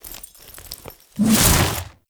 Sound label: Overhead Long Smash Attack Start; Enemies; Warframe; Grineer Nightwatch